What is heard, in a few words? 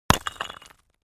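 Cinder Block Hit Sledge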